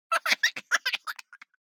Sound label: BABIES LAUGH Boy 12 months Heavy Short; Baby Boom